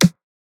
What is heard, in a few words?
Acoustic Drum Fill